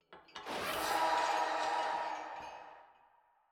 intro exterior; thresh; lol

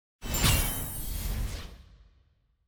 finals win; clash; lol

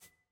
ui toggle hover

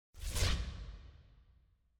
brackets match lose; clash

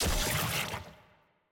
status bleed

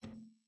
ui generic button hover

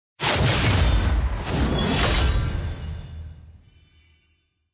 tier split reward; leagues; regalia

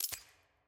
ptt release; premade voice; vc party

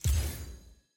click and slide

